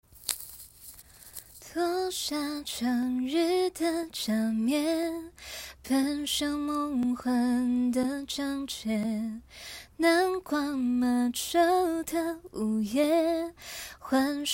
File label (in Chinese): 清唱片段